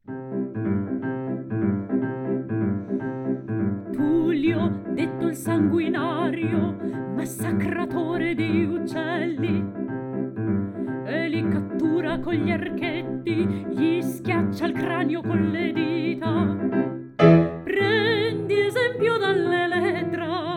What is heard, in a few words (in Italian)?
Voce; Pianoforte